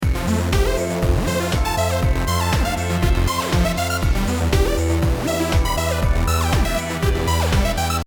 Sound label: synth lead